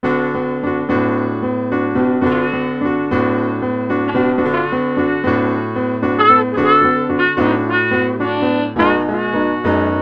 piano harmonicas 15edo

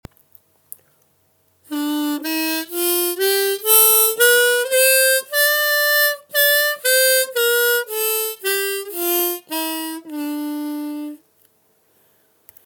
Harmonica Husseini

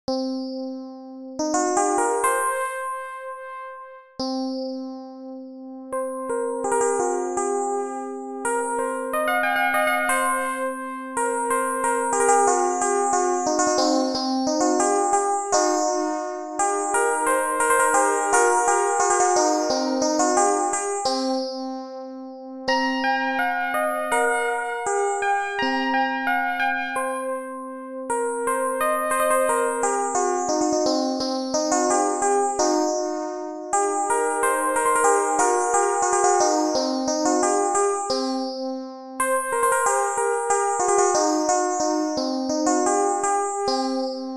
13EDO 33232 Melody

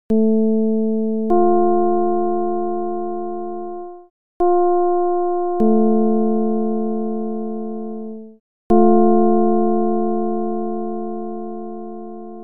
csound foscil 220hz

